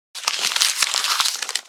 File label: Thin paper cumple rustle